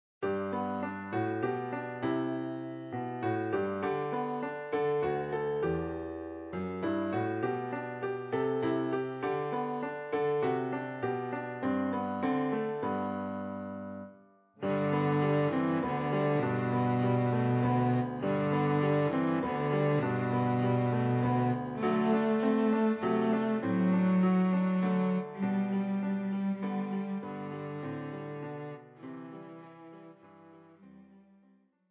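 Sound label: Silent Night, Violoncello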